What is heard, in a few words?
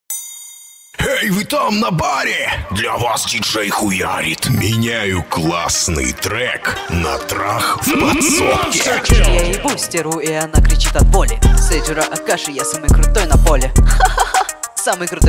slowed reverb